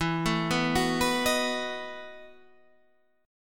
E Major 7th Suspended 2nd Suspended 4th, Guitar, EM7sus2sus4 chord; arpeggiated